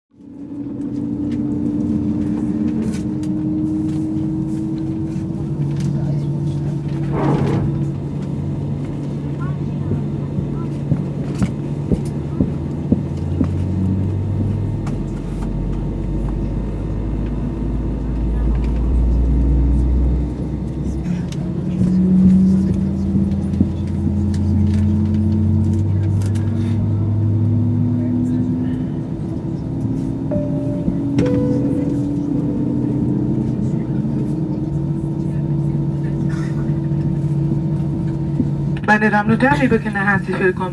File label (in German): Start Flug